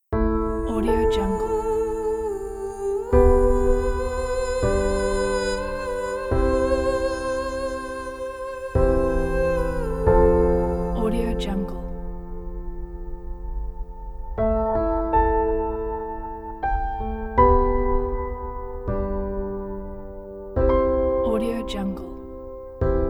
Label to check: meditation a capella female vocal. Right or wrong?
Right